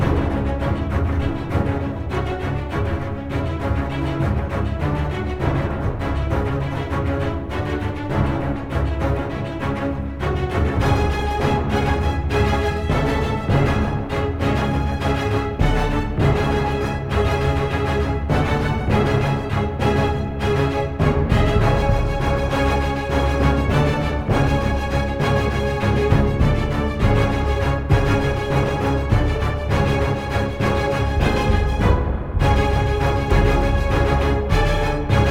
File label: Music Shooting Gallery Heavy Loop; Shooting Gallery 02